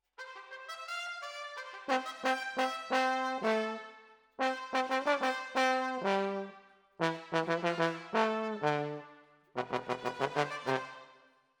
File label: Trombones, jazz for piano